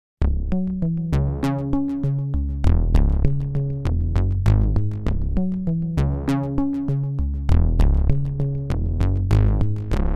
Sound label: Dual Path PWM Seq